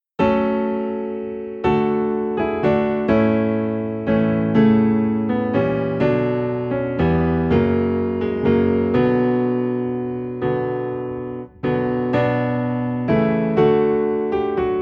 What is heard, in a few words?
Piano Low in B Minor; christmas; What Child Is This